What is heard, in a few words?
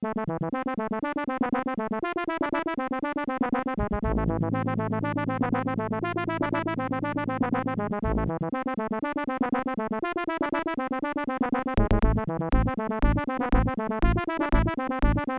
credits sb 1.5 ct1320 (adlib); soundcard test; Silpheed